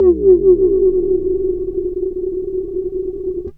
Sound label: Bass Lick, Drum & Bass; Fluid Dynamics